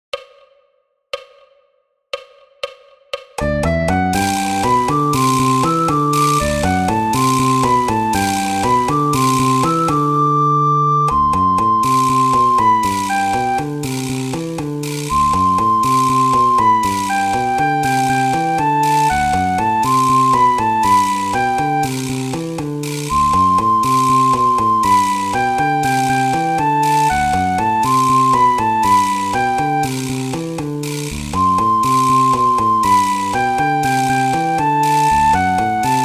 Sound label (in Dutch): sopraan; Flierefluiter 6